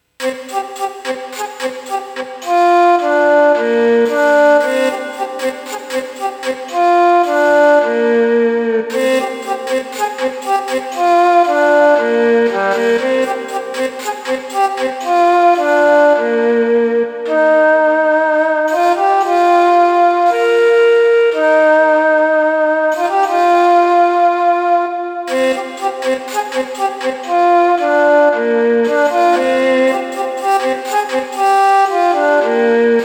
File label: Meditation Music - Deep Meditation